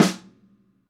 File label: Snare Tight High Vol